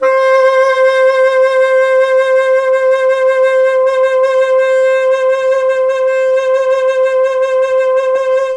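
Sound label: C4 tenor sax, mellotron; CHMB TNR SAX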